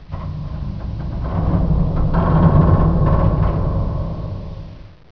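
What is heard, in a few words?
metal creak; de Nostromo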